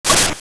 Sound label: Splash; Zelda; MC; Link